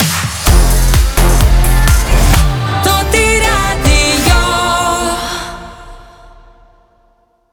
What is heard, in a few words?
Transition Fast Medium, radio jingles; Toti Radio 2023